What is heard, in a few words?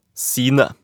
sine